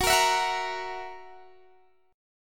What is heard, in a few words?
Gb 7th Gb down Guitar Open D, chord